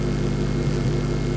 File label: neon hum